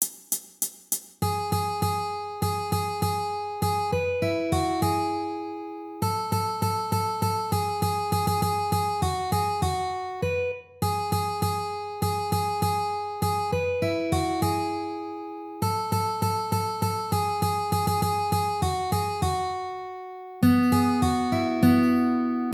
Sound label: Jingle Bells Easy